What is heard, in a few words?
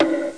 Hit-Lose; Professor Fernleaf from the Tropics